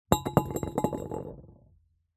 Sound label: foley bottle glass large empty set down wobble concrete